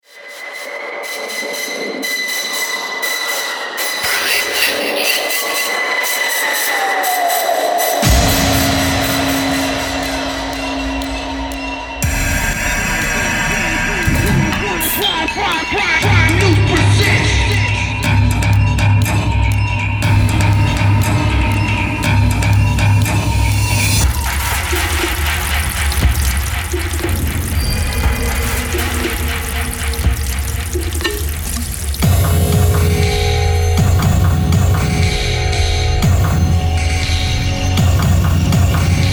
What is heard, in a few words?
industrial sfx